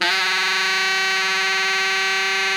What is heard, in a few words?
TENOR GROWL G 4, Saxophones; Greytsounds; Philip Wolfe David Crozier's Saxophones